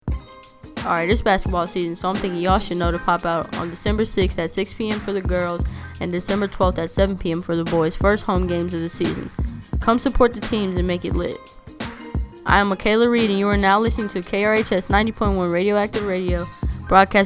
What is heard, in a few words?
Jingle